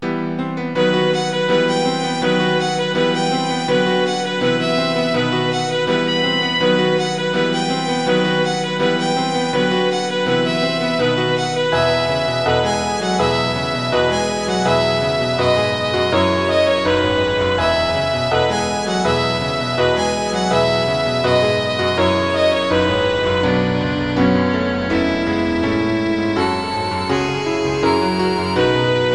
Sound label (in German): violine u klavier; kinderszenen; kind im einschlummern